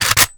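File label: foley assault rifle akilo47 drum reload empty chamber; weapons; viper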